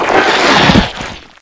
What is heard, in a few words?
vacus die, enemy; general combat